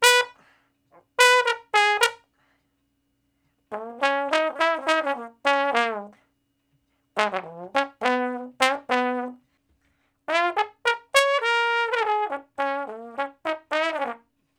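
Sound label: Trombone Slow Blues (Db I, IV, I, I, IV); Crimson Blue and Fabulous Horncraft 4 RnB; Horncraft for R&B; Solos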